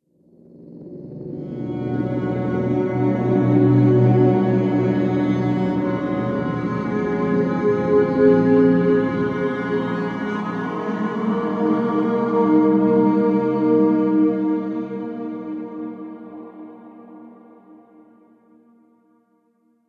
Shelter; Dead Rivals; Android

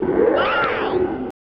Eaten by Sea Monster

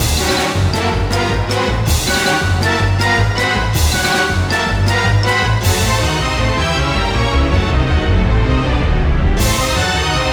Cave In! Jingle; Drihoo

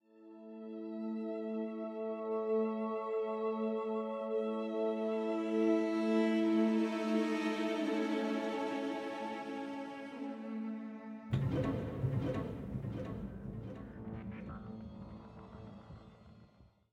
85bpm; Imperium High; Legends of Pegasus; windows gamerip 2012

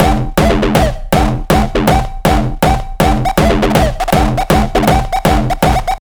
Beats Loop; Anger Foot Demo; Angerfoot Beats 12

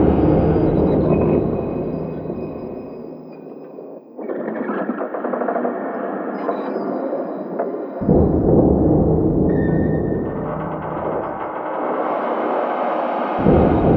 dead eye scratch

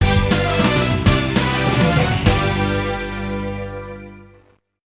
Draw; MLB Power Pros

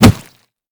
Fishmen Macemen Bulava Attack; We Are the Dwarves

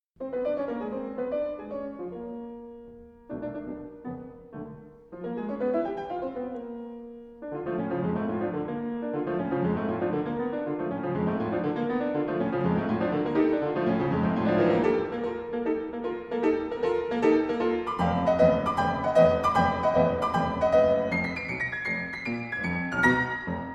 Piano Calm

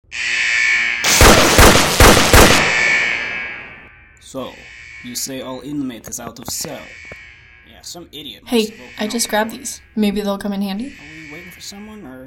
Sniper + Taser

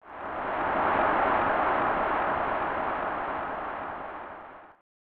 Opening (Cheer); dolucky's kusa yakyu; snes

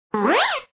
Cape flying

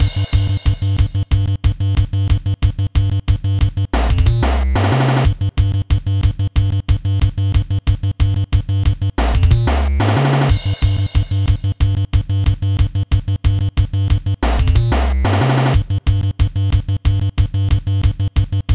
Anticipation (Instrumental); Friday Night Funkin' Vs. Toriel

